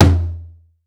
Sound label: high floor tom drum e key, tome drum sample tuned to the E note. Loudest frequency: 680Hz